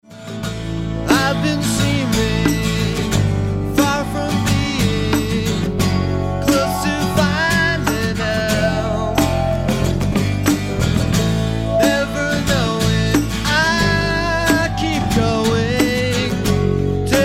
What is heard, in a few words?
Acoustic